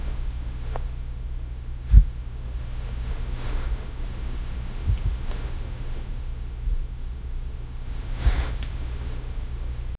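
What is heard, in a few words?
calm romantic sleep ambience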